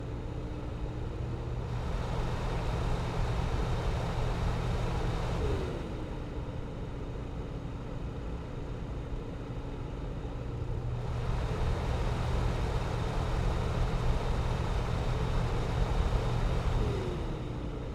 Ventilation Fan, small box, metal, electric motor, disconnected, whirring, spinning, restricting airflow; Eiravaein Works; Vaeyan IV